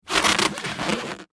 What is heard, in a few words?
Foley Balloon Rub Short; Balloons; Cartoons